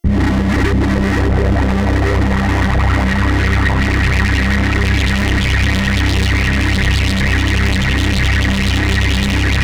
Random Lifter; AMG - Prototype Drum & Bass

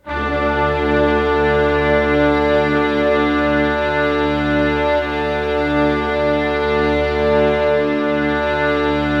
Band Orchestra D5, brass; Giga Samples Collection

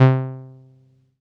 505, String Machines

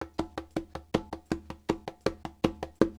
Conga, Latin Percussion

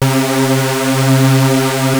Prophet 5 C4; Hollywood Edge - Giorgio Moroder Rare Synthesizer Collection

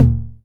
909 MIDTOM

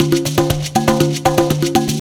120 PERC; Best Service Advanced Media Trax Modern Composer

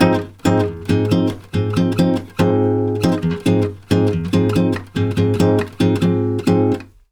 BOSSA G#; USB Soundscan; AKAI; Brazil Bossa

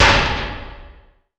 tyre, Impacts, Hits & Booms; Distored Reality

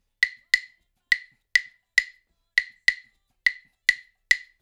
LOOP, Percussion

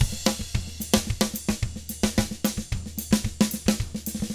SMBA, 110 SAMBA, Drums

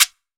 GIRO SHT, GM PERCUSSION; AKAI S6000 CD-ROM - Volume 5

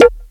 PERC, PERCS KIT; Ragga & Dub Reggae; USB Soundscan vol.27; AKAI

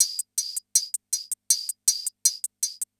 CR78; Best Service; XX Large Most Wanted

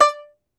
Tenor Banjo, Plucked Strings

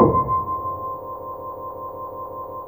Piana C3, Pianos; Fairlight CMI IIe

